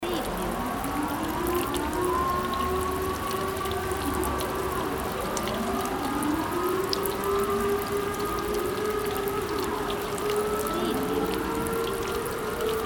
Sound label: Siren in the Rain